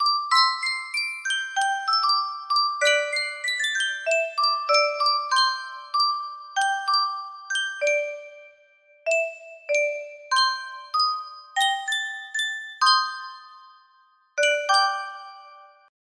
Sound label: sankyo music box